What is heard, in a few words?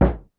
Thud Lo, Perc; Lost Tapes From Mars